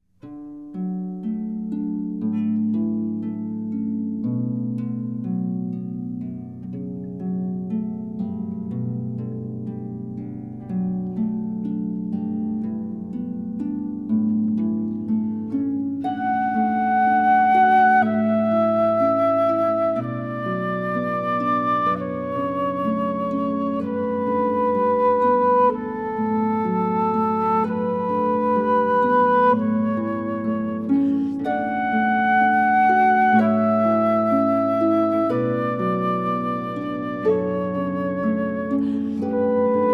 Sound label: harp